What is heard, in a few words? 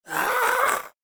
idle, zombie; DayZ-Epoch; dayz sfx